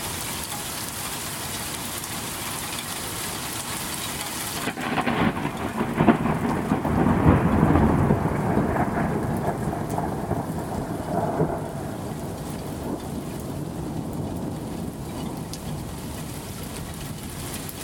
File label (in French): orage; chaudon; corinne leforestier